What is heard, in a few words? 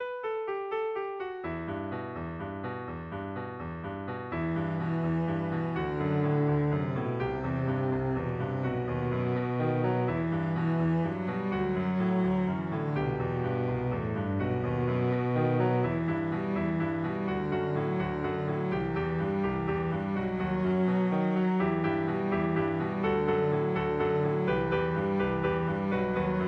cello, spanish violin